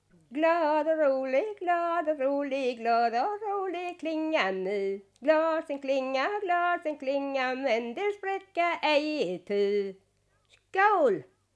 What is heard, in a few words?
Folk music of Småland; Glad och rolig